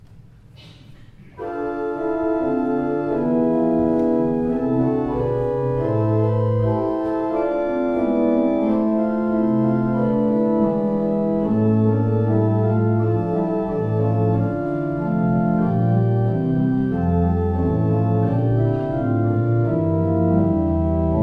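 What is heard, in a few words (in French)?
choral, orgue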